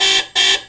car beep

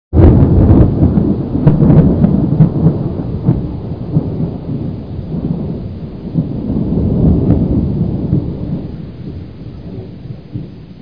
storm exit; citystorm

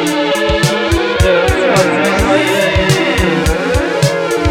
power

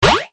Boink

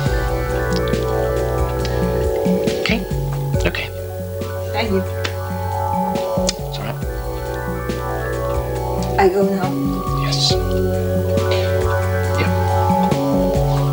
jam loop

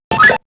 computer

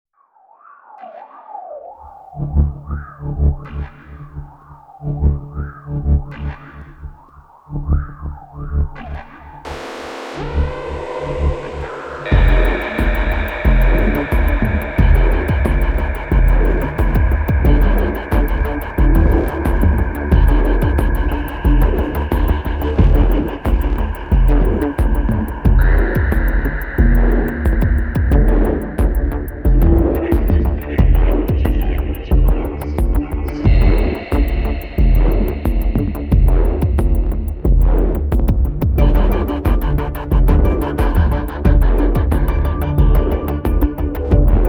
Noise Floor

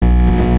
application fault

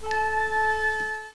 Beep; TOS

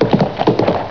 canter

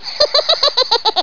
laugh

